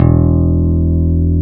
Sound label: PICK BS E1